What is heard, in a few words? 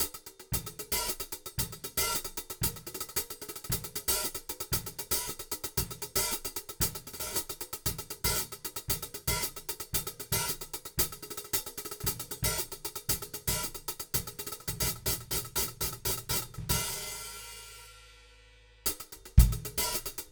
hihat, KM84